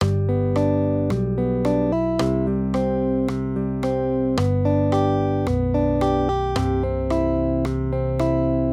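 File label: Guitar, Accompaniment, Major Triads, G-C, 110 BPM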